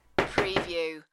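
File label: Ping Pong Racquet Table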